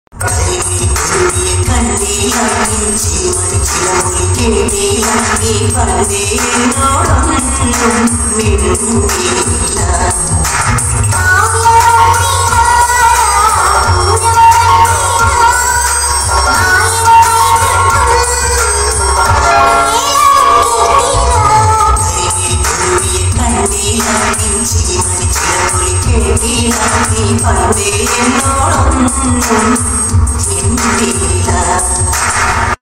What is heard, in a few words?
tamil bgm ringtone tamil love bgm ringtones